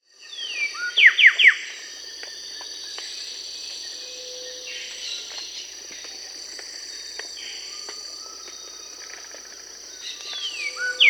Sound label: Moustached Wren (Thryothorus genibarbis), Peru; Birds of the Amazon; S. America